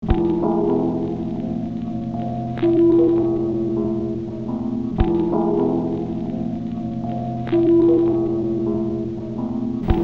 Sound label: W Chimes 24 W Children Softly